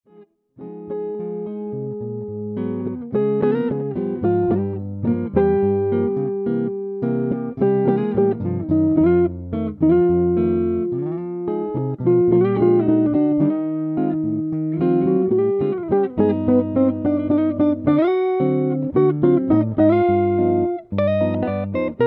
Tube Booster Vs Bad Cat 2 Tone, Guitar

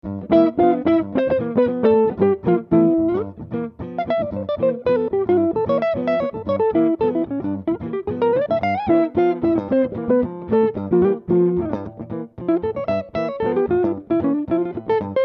Hohner, Guitar

